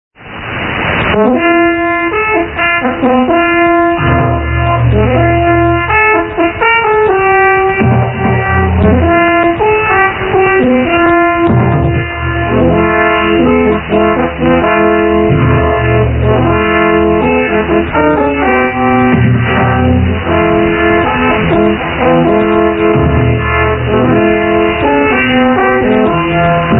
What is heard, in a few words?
interval signal; mwv african pathways